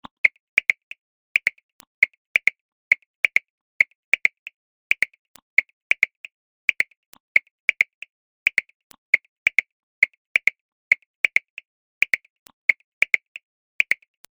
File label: Perc 135bpm; Crowdsourced Sample Pack; TRUTH-HZ; Drum Kit; Percussion